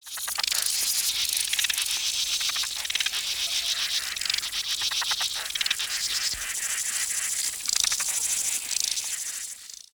ochen mnogo sverchkov

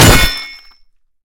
metkij bogatirskij udar

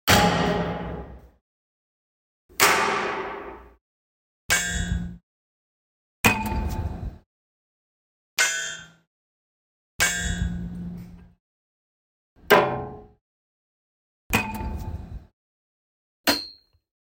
mnogochislennie zvukovie effekti udara po trube

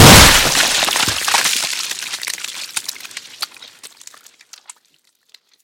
nesilnij vzriv vnutri derevyannogo saraya

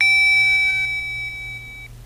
klavisha igrushechnogo pianino nenastroennaya mi

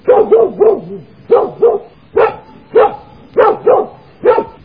storozhevoj pes zashishaet territoriyu

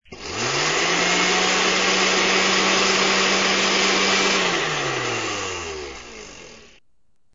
obichnij domashnij pilesos

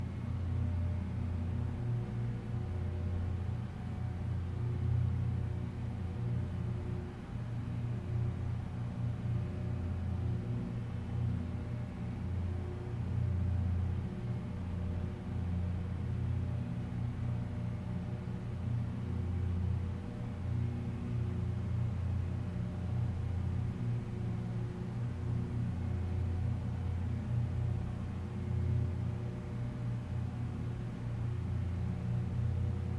gulkij otdalyonnij gustoj zvuk raboti proizvodstva

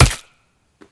moshnij udar kastetom v chelyust

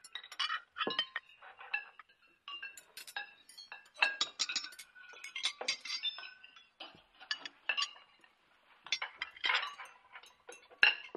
atmosfera uzhina zvon posudi

